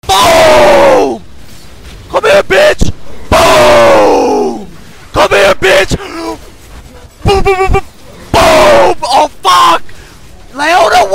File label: boom long; Trick2g-Soundboard